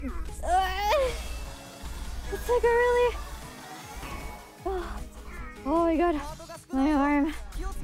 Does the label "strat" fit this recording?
No